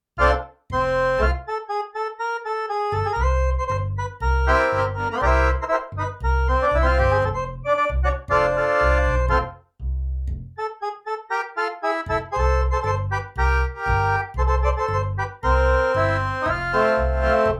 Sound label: Polka ed Gar Rev